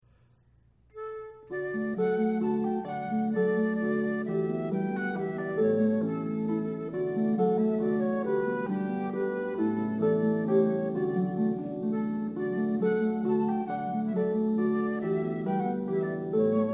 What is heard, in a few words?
flute harp; traditional the ash grove